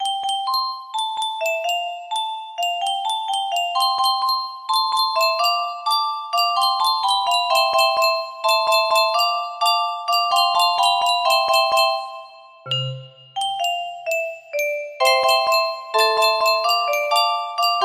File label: music box